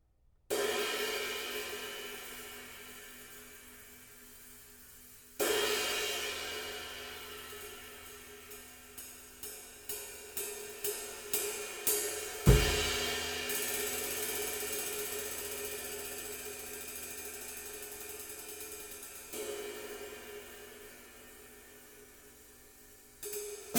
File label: Hammer